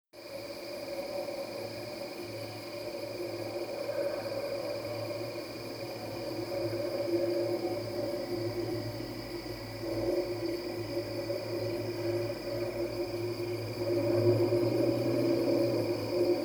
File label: amb Tweak Crickt